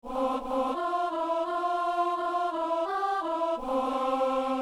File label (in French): psaume alto; Psaume 18 (19); Messe du 17 janvier à Saint-Denis